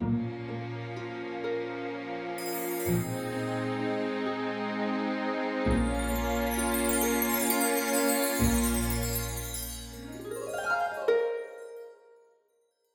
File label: Sylvan Waltz (loop intro)